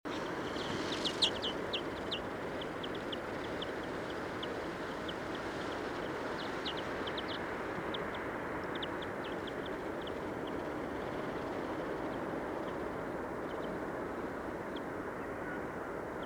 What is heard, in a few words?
Chloris chloris; SETE